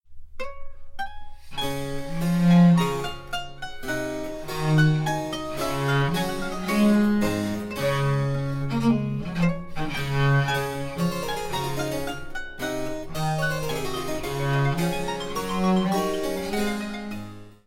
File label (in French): SONATA Re Majeur Maestoso Espressivo